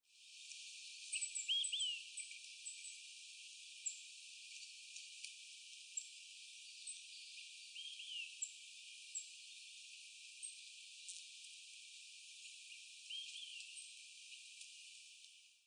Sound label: Olive-sided Flycatcher Distant Songs